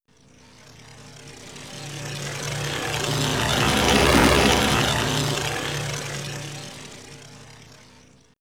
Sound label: engine